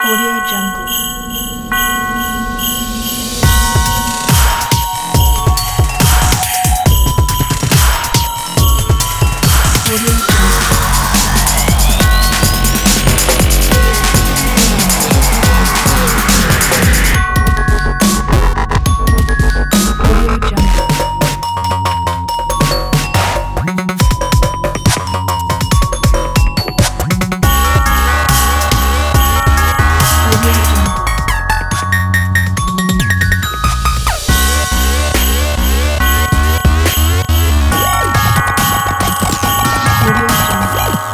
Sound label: jingle bells dubstep